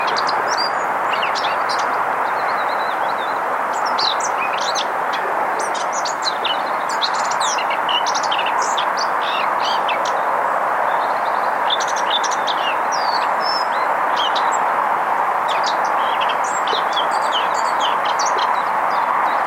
fauvette tete noire etang

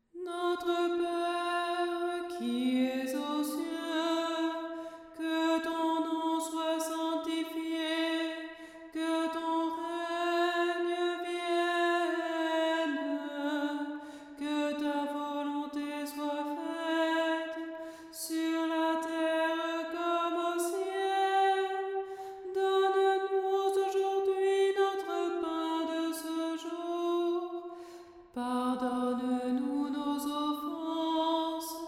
voix 2; notre pere rimsky korsakov

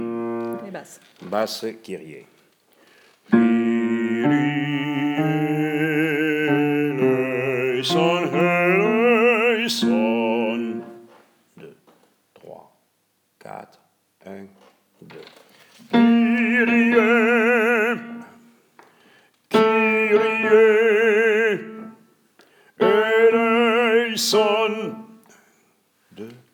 basses

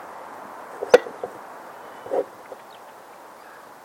alouettes champs

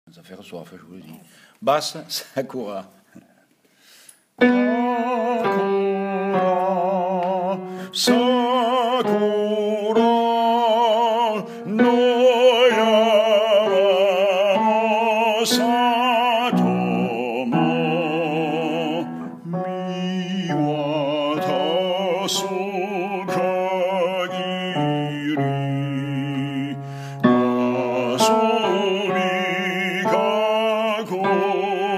basse

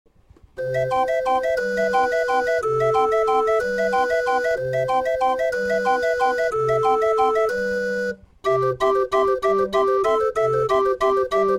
orgue 3 petites notes

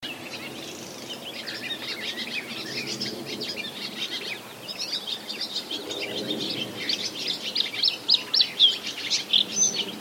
gorgebleue à miroir

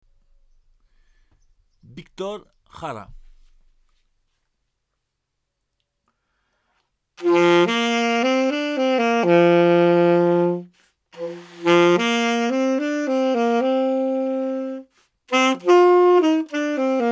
saxophone

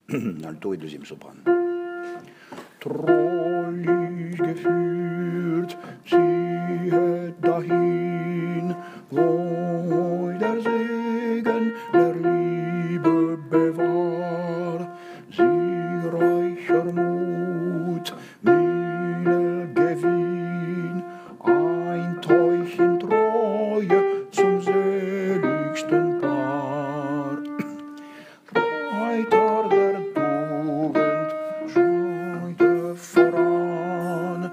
alto

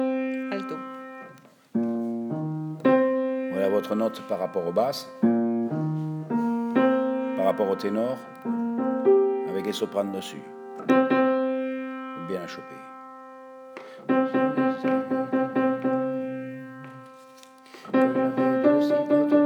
altos

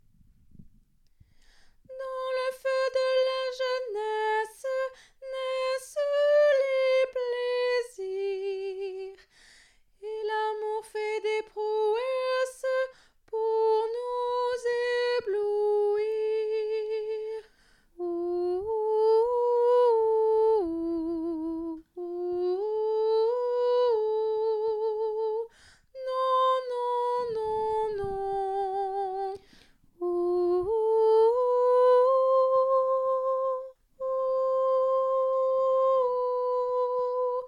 tenor

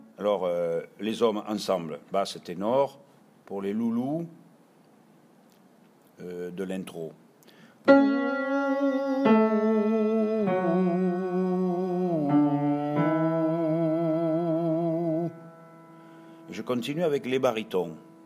hommes bariton suite

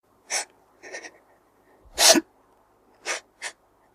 pleurs de femme

